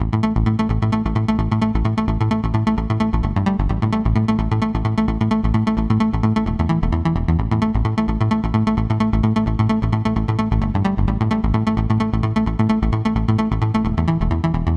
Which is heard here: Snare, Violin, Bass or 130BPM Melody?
130BPM Melody